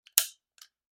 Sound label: Lighter Click; SoundsCrate